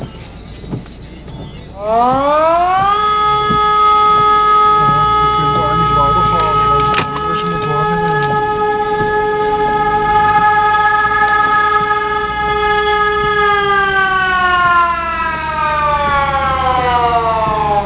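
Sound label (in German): sirenen, sirens; huegelheim ruhrgas; muellheim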